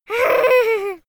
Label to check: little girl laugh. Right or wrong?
Right